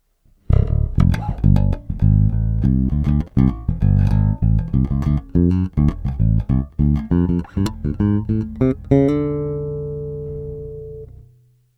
fingerstyle flat, basso elettrico artigianale

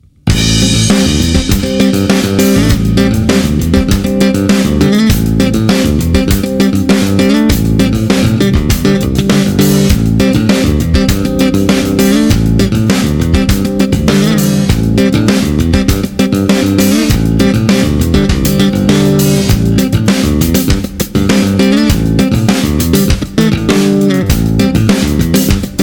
tapping funk; Acero occhiolinato

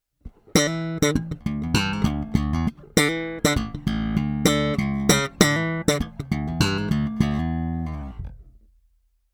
slap flat; Biarnel lowZ 3e2; Attiva a due vie; Acero Occhiolinato

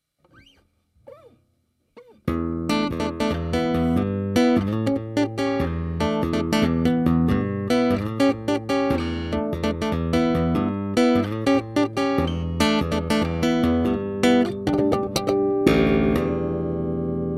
funky tapping

